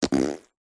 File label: human hands wet squeeze together fart noise